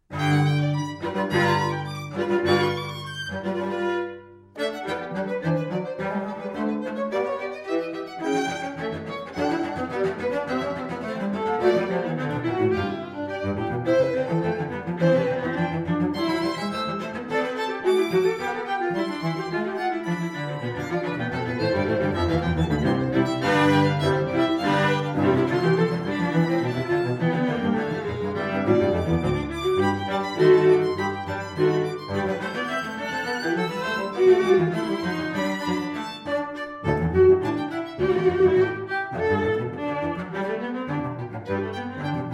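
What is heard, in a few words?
string trio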